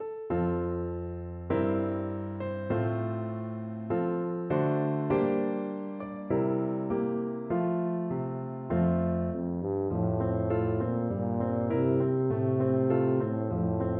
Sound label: home sweet home, tuba